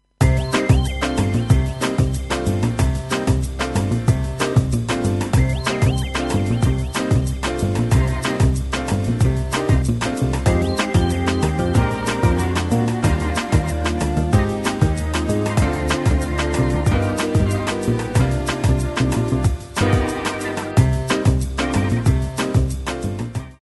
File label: organ